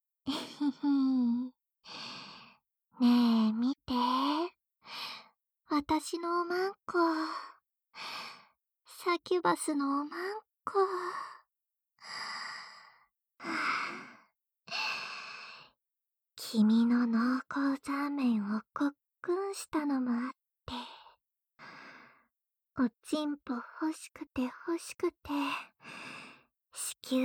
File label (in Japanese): くっさいマンカス塗れおま〇こと汗だくセックス ぐちゅぐちゅ耳舐め SE有り; ASMR